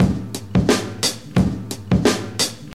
88 bpm drum groove g key, Free breakbeat - kick tuned to the G note. Loudest frequency: 1728Hz; drum loop